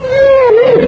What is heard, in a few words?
alert close, fast zombie